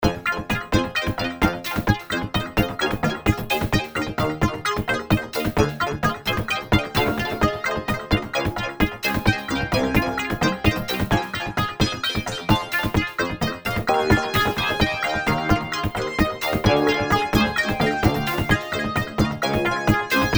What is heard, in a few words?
synth performance, access virus